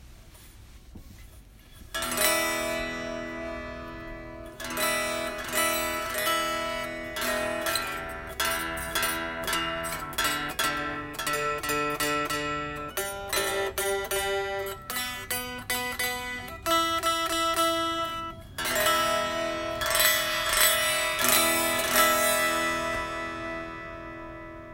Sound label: brass block